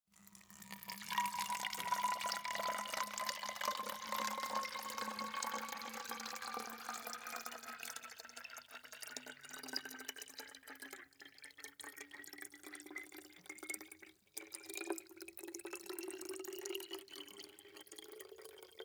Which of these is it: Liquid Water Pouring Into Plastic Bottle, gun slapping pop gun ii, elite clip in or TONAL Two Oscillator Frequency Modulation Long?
Liquid Water Pouring Into Plastic Bottle